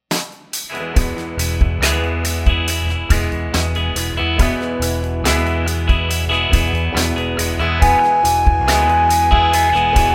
scale degree

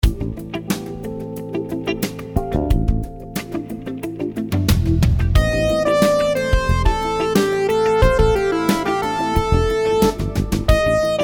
rhythms 16s syncopated

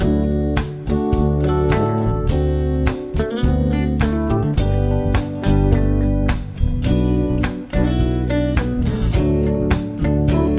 progs with applied chords

